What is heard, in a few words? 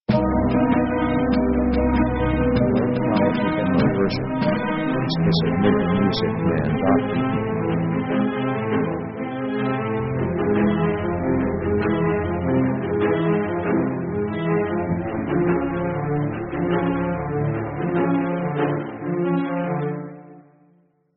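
orchestra dark arp; Dark mood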